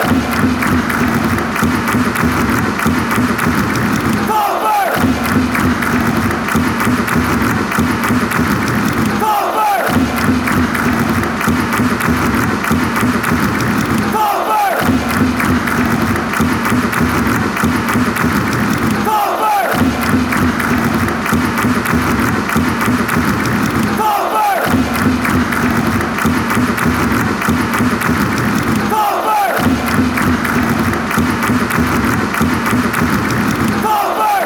drums; vfl

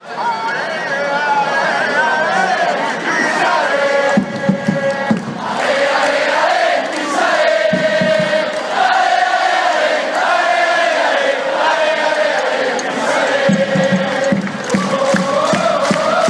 best pisa chants, fan chants

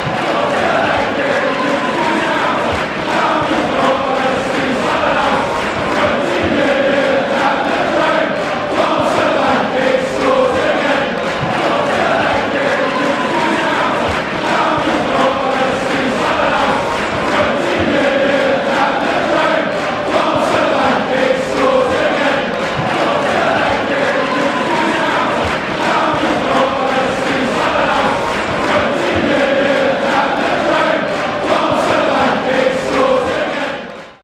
player chant; solanke; tottenham; united kingdom

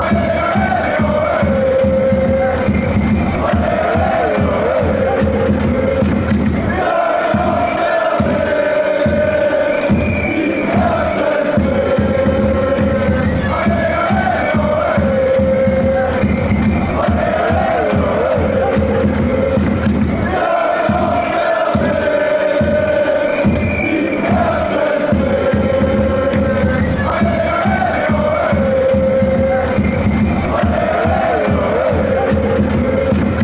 allez allez, fan chants

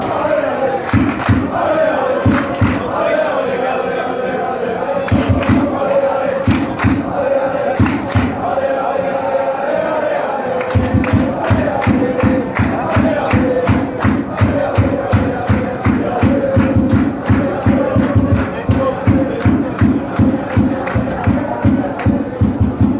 claps

